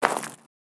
Left Gravel Footstep

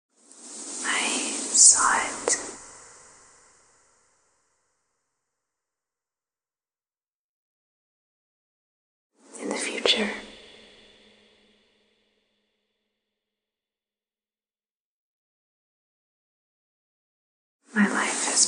Vocal

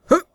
Male Jump

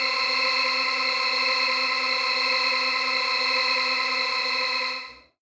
Vampire Apparition Or Vampire Awakening

